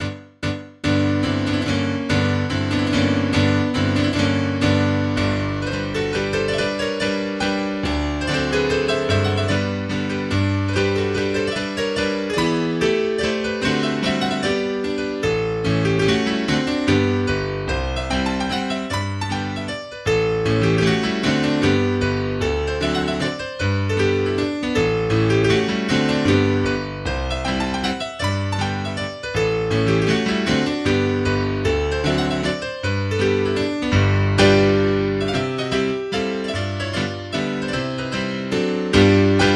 in G Major Vivace; Mazurka No42; Opus 67 No1

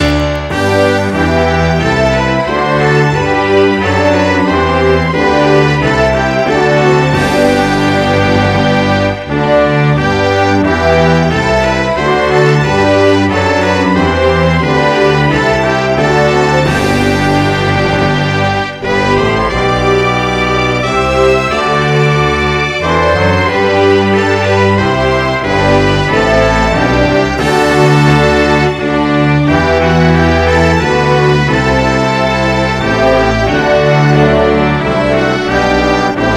Netherlands national Anthem

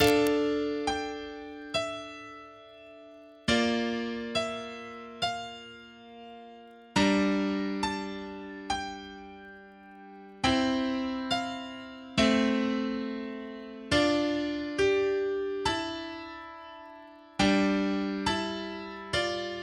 piano; naruto; itachi theme cover piano

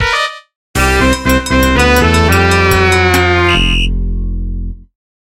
Game Over

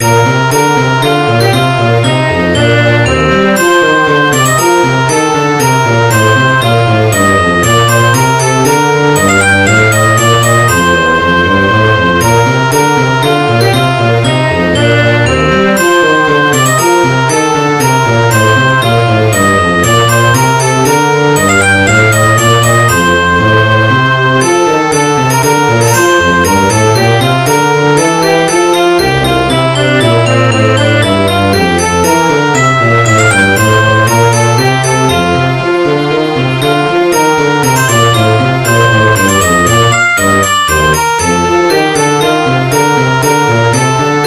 Minuet in Bb Major

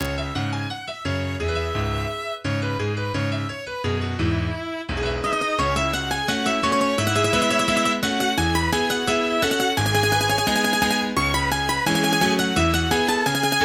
Beijing Opera Qupai; No1 Small Door

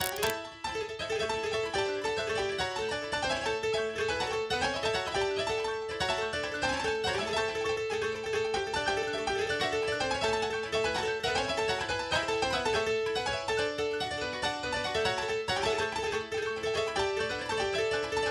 BANJO; banjo playing

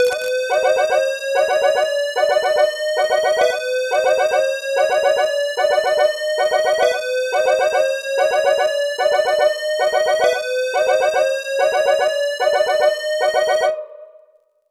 South African EAS Alarm Mock

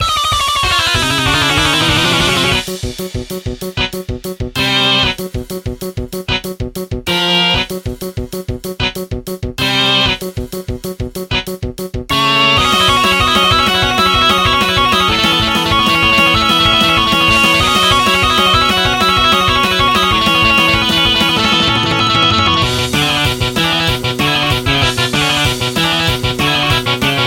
Battle Drums; RGBY; Gym Leader

